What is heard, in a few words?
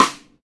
original snare sample c sharp key, Royality free snare drum sound tuned to the C# note